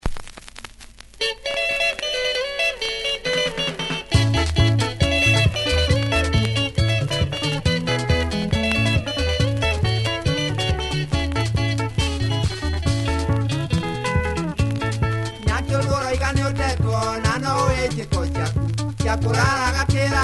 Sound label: Nice Kikuyu Benga with a more traditional B-side, bottle and accordion